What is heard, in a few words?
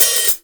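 open hi hat, Real Drum Kit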